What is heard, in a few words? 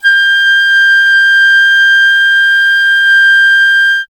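FLUTE PICCOLO G; Miroslav Vitous - Solo Instruments VOL-2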